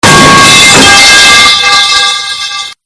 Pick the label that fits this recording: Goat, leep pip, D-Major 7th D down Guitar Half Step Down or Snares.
leep pip